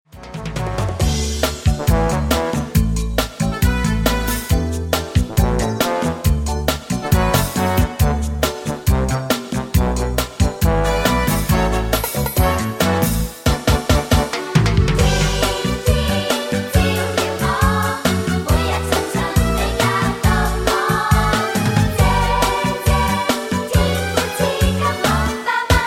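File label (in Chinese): Karaoke, 兒童詩歌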